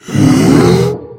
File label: frail charge